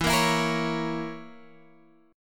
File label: C sharp Minor 6th E